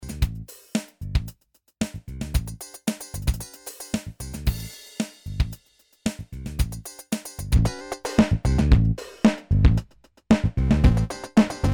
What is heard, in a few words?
roland tri, moogerfooger freqbox; con sync; VCO: 0 SYNC: SI WAVE:tri ENV AMT: 0 FM AMT: 0 MIX 4 con modulazione su VCO; MF-107